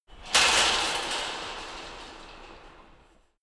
metal fence big rattle

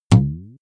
boing

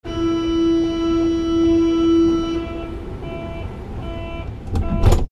underground door alarm sound and closing

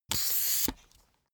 soccer pull out pump

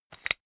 umbrella telescopic extend fast

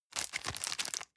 plastic bag small turn medium

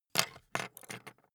pen put down

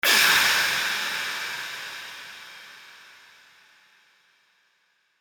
ambient ahh space

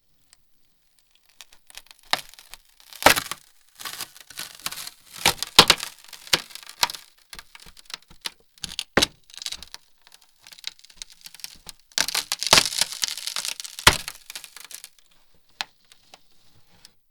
wood splinter low

